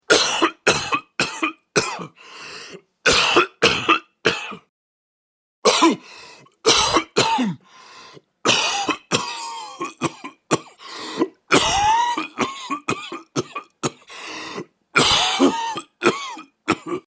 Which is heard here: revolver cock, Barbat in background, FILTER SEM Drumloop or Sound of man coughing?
Sound of man coughing